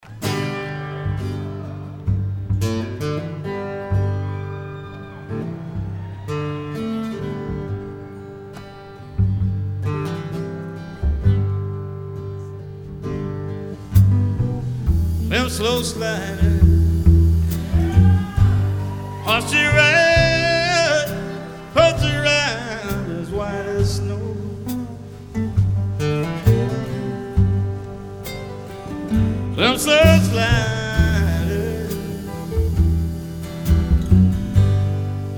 Live at the Hollywood Bowl

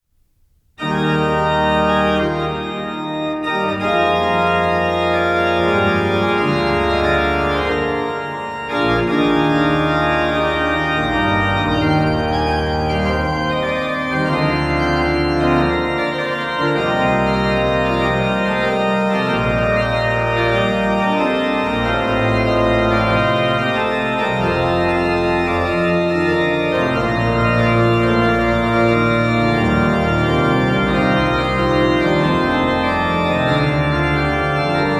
Prelude in E flat major, Bach Great Organ Works; Prelude Fugue in E flat major, BWV552 St Anne - I. Prelude; Johann Sebastian Bach

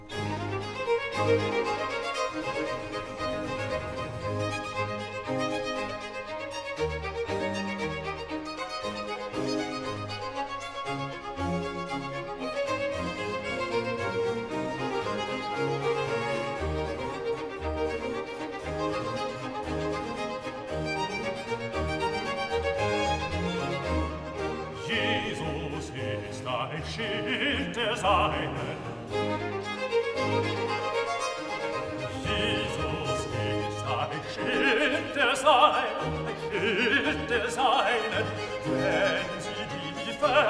Aria; Am Abend aber desselbigen Sabbats, BWV 42; Jesus ist ein Schild der Seinen (Bass); Bach

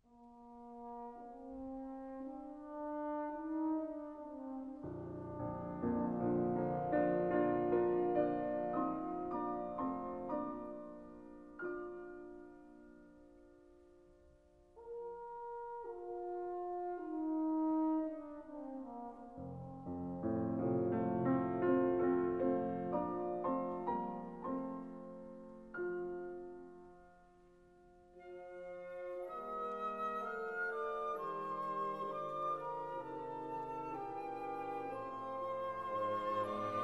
Piano Concerto No. 2 in B flat; Brahms

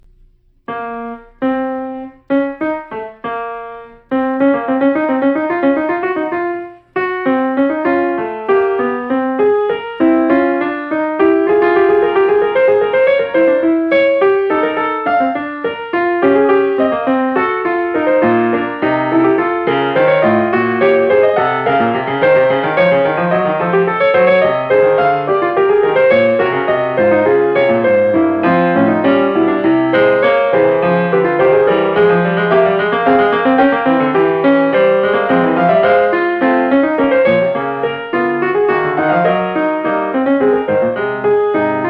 Fugue In B Flat Minor; J S Bach

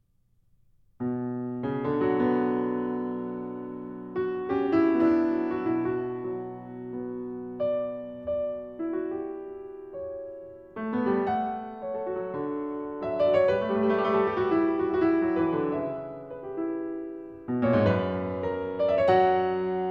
French Overture In B Minor

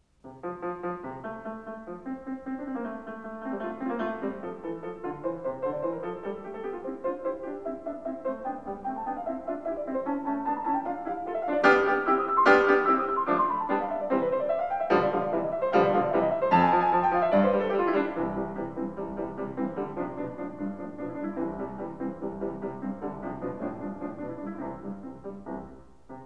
Piano Sonata No. 6 in F major; Wilhelm Kempff; Beethoven; Piano Sonatas

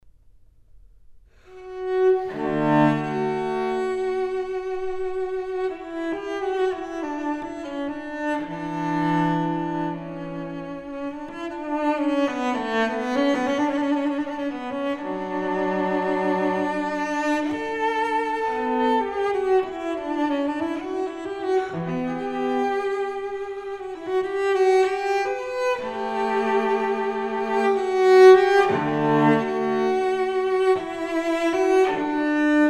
Suite for solo cello No. 6 in D m; Bach; Cello Suites

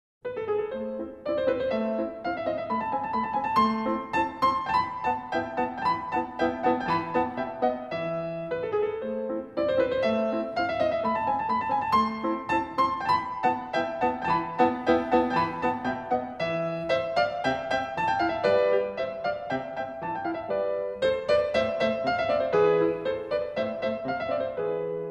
Piano Sonata No. 11 in A major; Wolfgang Amadeus Mozart; Mozart Greatest Hits

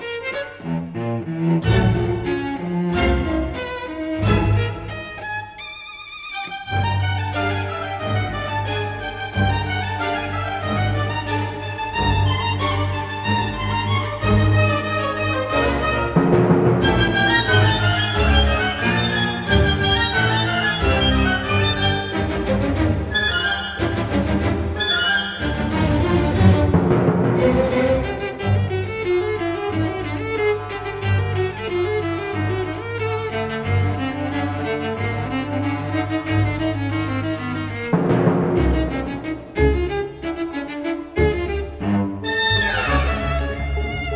Concerto for Cello and Orchestra in E-Flat Major; Shostakovich; The Philadelphia Orchestra; Great Performances

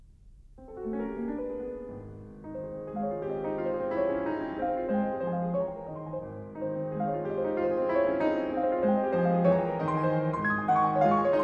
Prelude in A flat major, piano; 24 Preludes

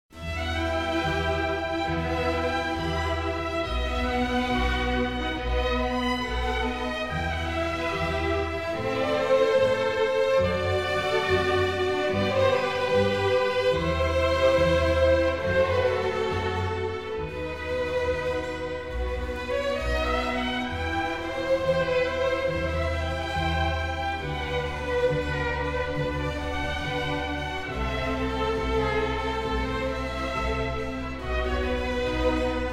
Flute Concerto In G m; Pergolesi

